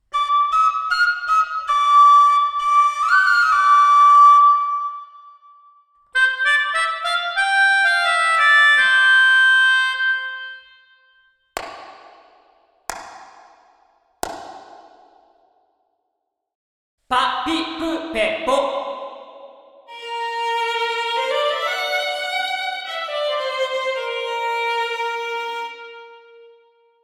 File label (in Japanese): チェンバー：Studio 1, 明るく、リッチで、長い余韻; このスタジオの最も有名かつ象徴的なサウンド。明るく、リッチで、長い余韻。